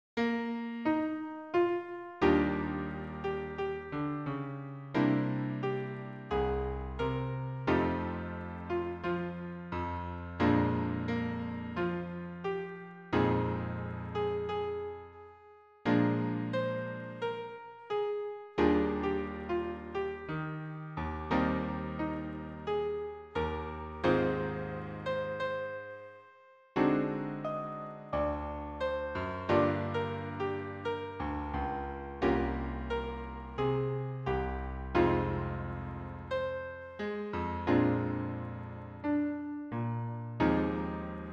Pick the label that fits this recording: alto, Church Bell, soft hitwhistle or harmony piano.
harmony piano